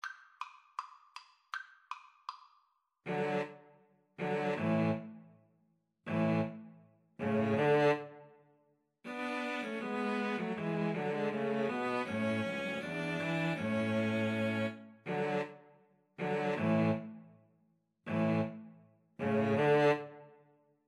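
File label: string trio, violin, 2 cello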